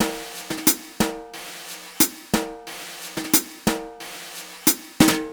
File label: Jazzy C, 90BPM; Сэмплы ударных (Легкая музыка); Beat; Drums; Easy Listening; loop; перкуссия; Ударные